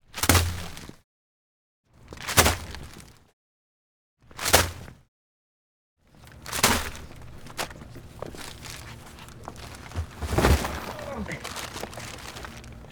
Brown Paper Bag Crumple And Toss In Trash Body Toss In Trash End